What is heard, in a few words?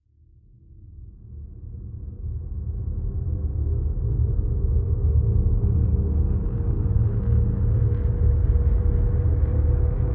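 drone A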